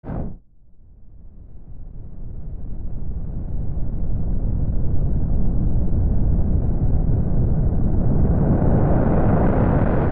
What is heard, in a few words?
Air Rush Longer